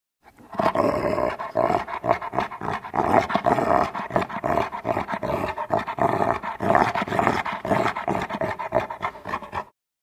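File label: Dog St Bernard Panting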